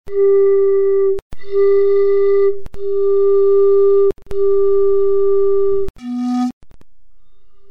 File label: Pipes